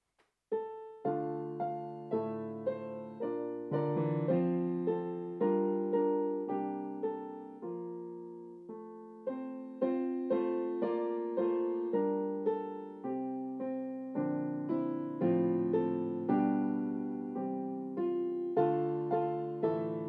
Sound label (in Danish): klaver; der staar en gammel graensesten